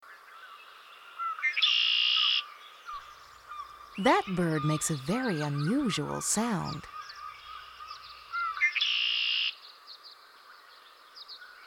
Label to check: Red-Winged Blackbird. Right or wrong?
Right